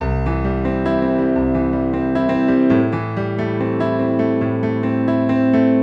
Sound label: Love piano; Petz 5